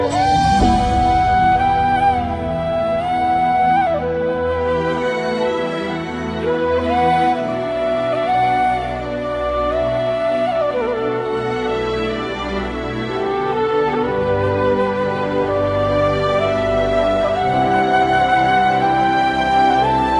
Sad Flute

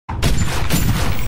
Hand Cannon

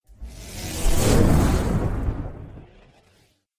notification ring

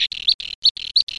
Bird Cliff

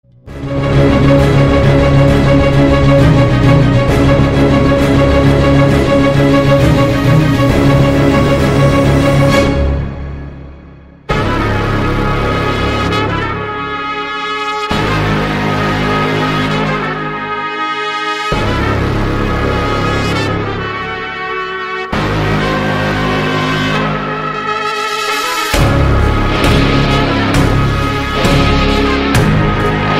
BGM; Petta

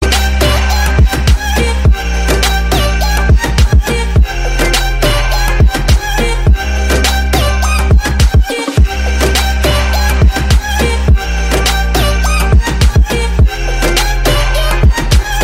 Bass powerfull

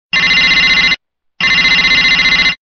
Electrical Ring

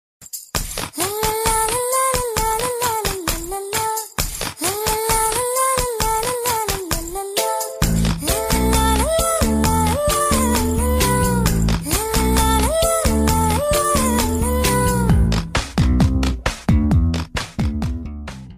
Nice Humming

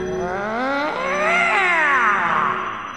Screaming; Zant

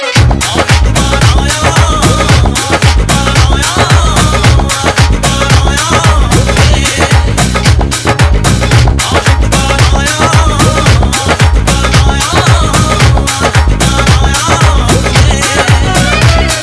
Dhol

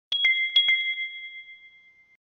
notification

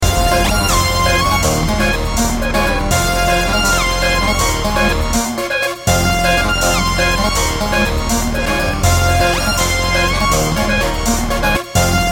Vaanam Beat

